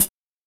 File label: nice pedal hi hat sample f key, Royality free hihat sound tuned to the F note